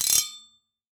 Radiator, household rhythm samples hits

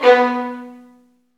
STR VNB2, Vlns Marc; Roland L-CD702; Vlns Marc1-3

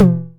909 tom, drum machines

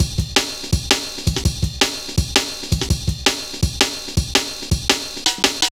Rollin' Ride, Jungle Warfare, Drum Loops, 165.5

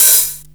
HH OPEN

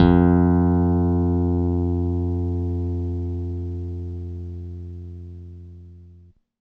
Bass F, Stick; Giga Samples Collection; BassSide

